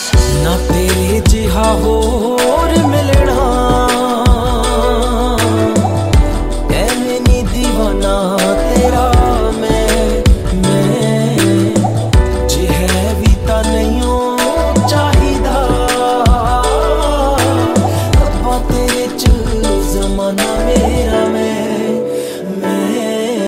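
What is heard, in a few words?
Punjabi ringtone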